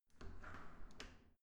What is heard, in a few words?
entrance door open normal